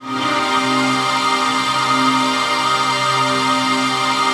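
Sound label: trance pad; ATMO PADS; Best Service; XX Large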